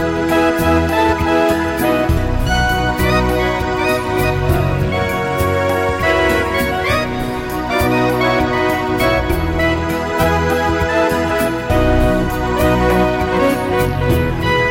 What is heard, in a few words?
Pretty Soft Beat